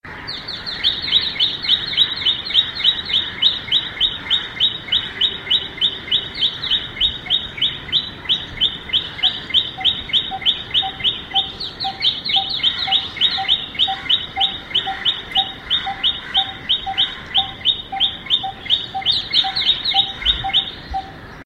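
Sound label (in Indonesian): cinenen pisang song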